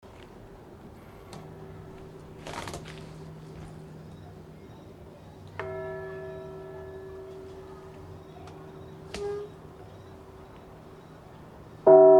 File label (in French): cloche; Scharrachbergheim